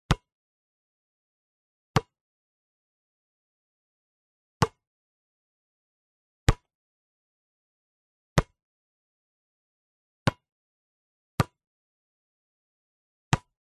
sport basket ball hard bounced x8 on asphalt concrete